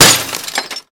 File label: Glass Breaks Medium; windows & mirrors